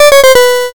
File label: lose